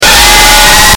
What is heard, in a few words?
SCREAM